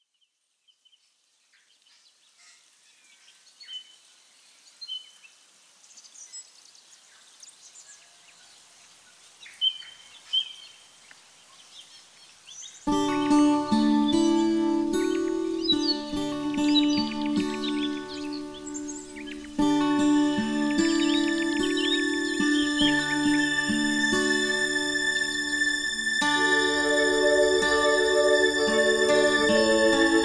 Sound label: male karaoke